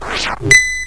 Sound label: victory; zsdx